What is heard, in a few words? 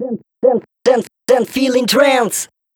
Vocals; Vengeance Samples; Special Sounds